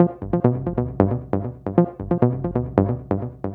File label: Synth, Techno